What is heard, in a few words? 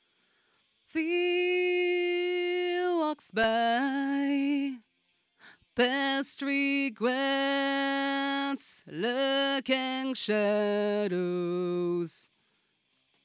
Vocal